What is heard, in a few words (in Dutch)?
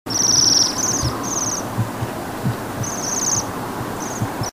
Pestvogel; tijdens de vogeltrek